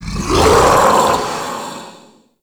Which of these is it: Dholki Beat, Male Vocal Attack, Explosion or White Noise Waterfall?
Male Vocal Attack